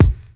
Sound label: crisp steel kick drum sample A key; kick drum samples